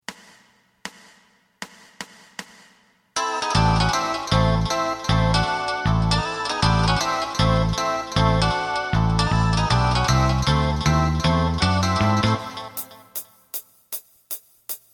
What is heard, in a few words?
drum